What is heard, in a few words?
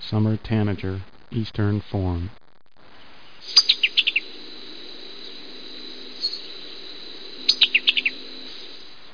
TANAGR CL, Birds, Coastal Louisiana; Explore Coastal Louisiana with Boudreaux